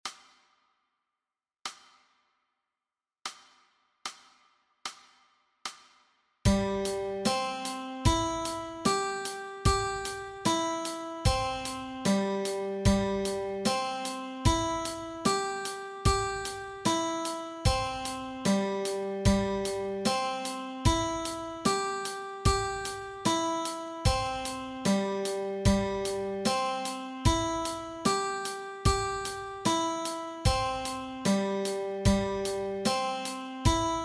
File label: Guitar Exercise